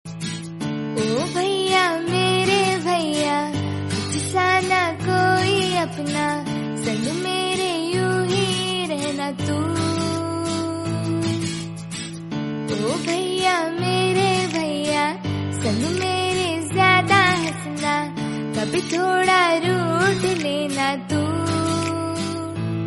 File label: Hindi Song